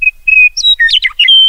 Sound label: meadowlark